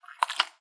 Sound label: Wet Rubber Slight; Sex Sounds; ero sfx